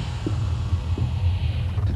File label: Door Close; Capt Mess